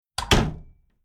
Household Door Old Spherical Latch Closing Two Parts Quick; Tom Hutchings